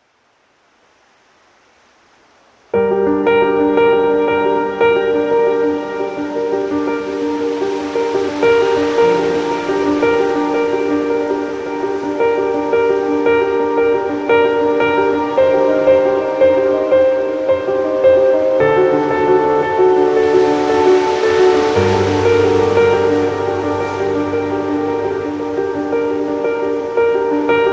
Piano

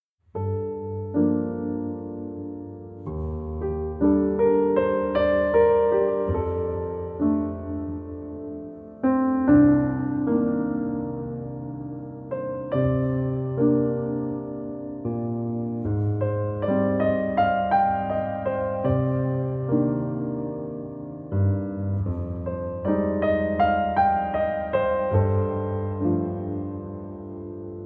Piano Theme

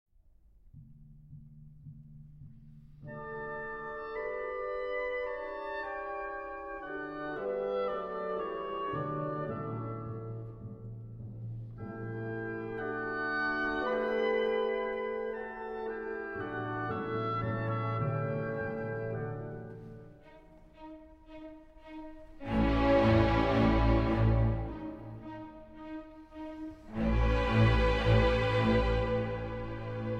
Violin Concerto In D, Allegro Ma Non Troppo

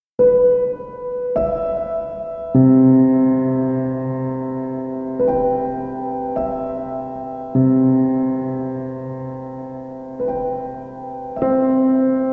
Piano Sounds

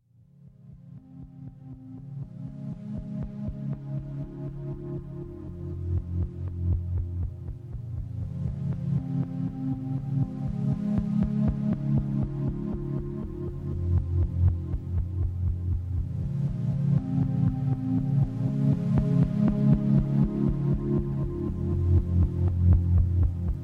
Drone

